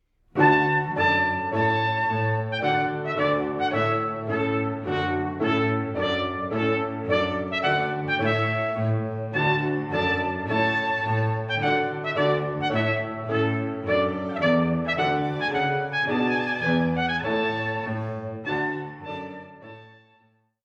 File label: Trumpet Piano